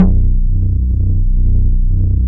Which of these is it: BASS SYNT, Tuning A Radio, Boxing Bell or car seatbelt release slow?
BASS SYNT